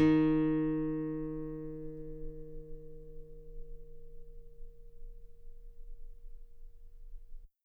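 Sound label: guitar D sharp 5 very long forte normal; bananenkeyboard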